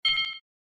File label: alert